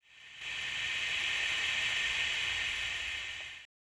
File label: steam, ambience